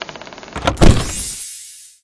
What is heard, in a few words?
open box